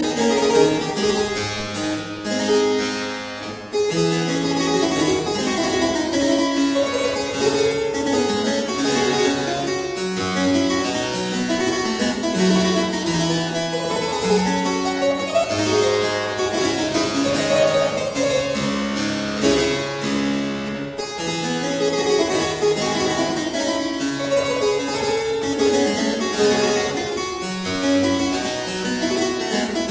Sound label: Keyboard Suite Courant; Froberger; Keyboard Suite No 1 in D minor; Arthur Haas